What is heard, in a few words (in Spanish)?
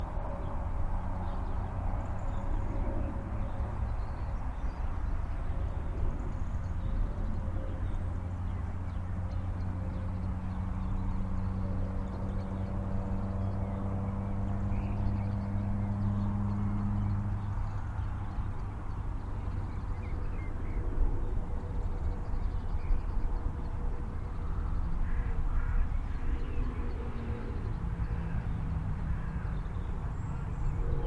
atmosfera